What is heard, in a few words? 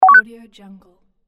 beep confirm